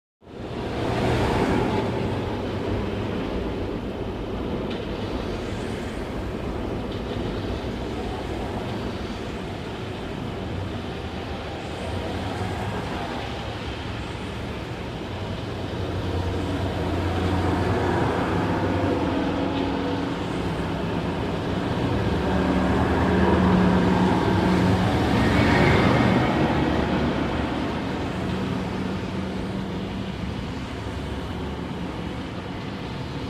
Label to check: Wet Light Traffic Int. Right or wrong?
Right